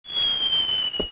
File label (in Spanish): caida crash; valve; deathrun crash2